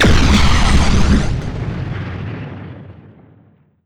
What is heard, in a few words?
pbeam rocket; weapons; ufoai